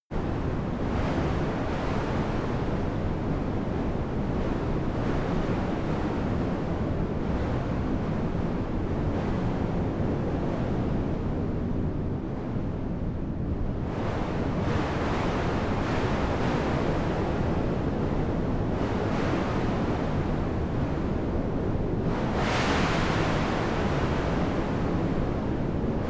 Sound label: wind soft exterior